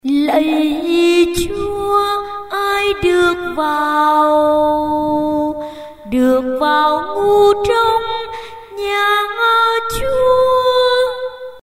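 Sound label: soprano tenor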